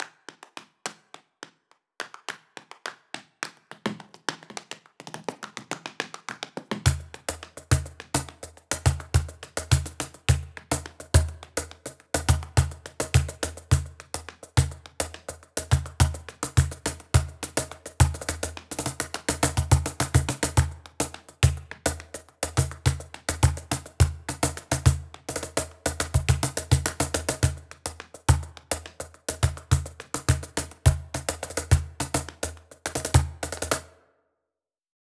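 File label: drums flamenco percussion; handy drums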